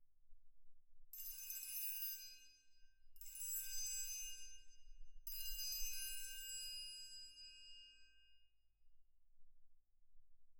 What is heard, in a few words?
Perc